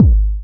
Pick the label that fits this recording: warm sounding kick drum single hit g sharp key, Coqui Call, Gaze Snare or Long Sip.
warm sounding kick drum single hit g sharp key